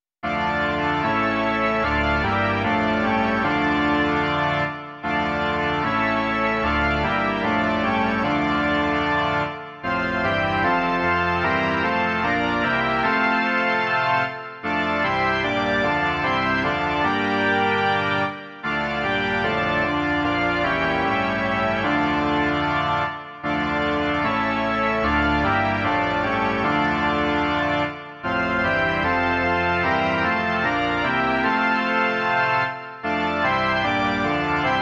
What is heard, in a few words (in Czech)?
varhany